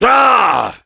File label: negative vocalization, fr; "(Grommelle)"; Heavy